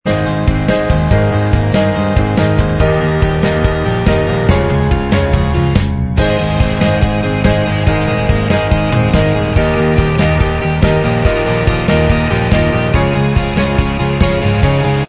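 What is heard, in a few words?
instrumental, backing track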